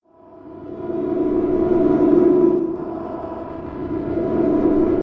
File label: drone; loopzone2